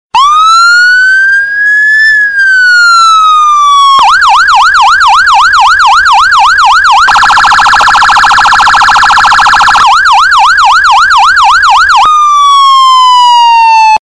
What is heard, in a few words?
Ambulance Siren